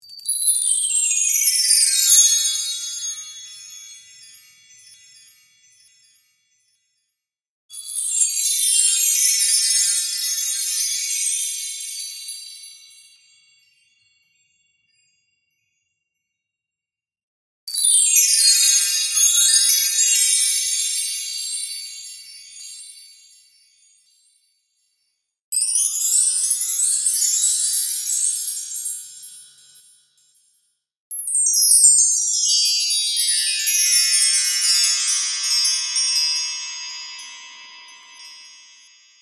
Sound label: Chimes Percussion One-Shot Samples; pieropeluche